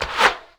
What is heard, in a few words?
arrow pass by; Mount&Blade Warband